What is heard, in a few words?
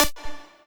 lead